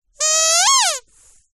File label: toy squeaky squeeze single